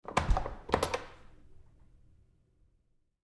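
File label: Door Bathroom Stall Lock Close Up Moves Lite; Bathroom Sound Effects; Big Room Sound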